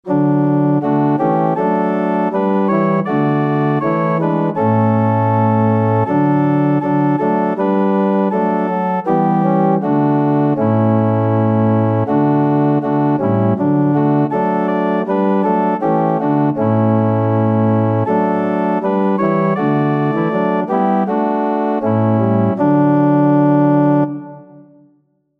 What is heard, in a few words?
fight the good fight, organ